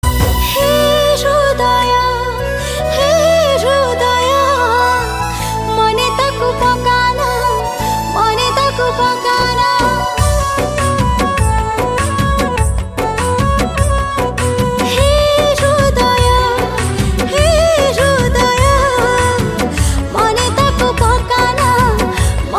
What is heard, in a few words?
Odia new sad song